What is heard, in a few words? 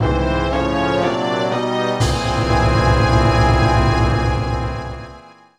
Dungeon Appears; Legend of Zelda, The - Majoras Mask